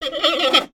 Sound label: Pets Bear Panda Happy; creatures pets; Lego